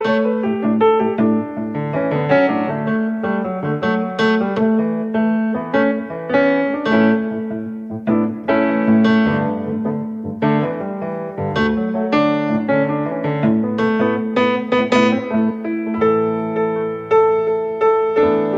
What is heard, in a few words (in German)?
Improvisation